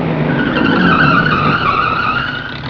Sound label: CAR SKIDS